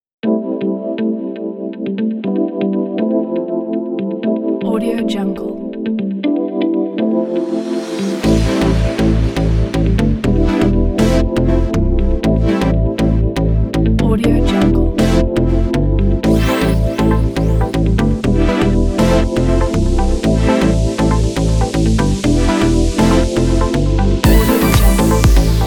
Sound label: fashion guitar; svyats